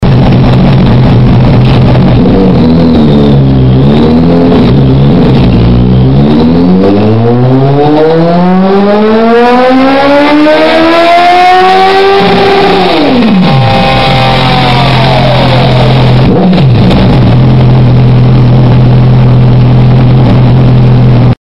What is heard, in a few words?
SUZUKI GSF 650 BANDIT